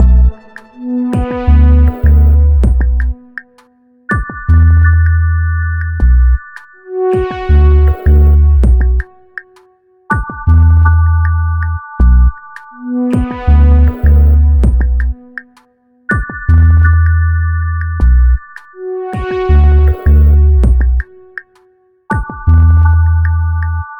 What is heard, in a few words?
stem outro; Pix the Cat